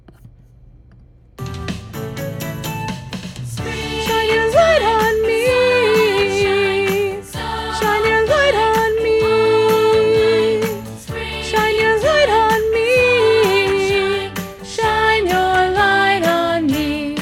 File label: Springtime Sunshine solo with chorus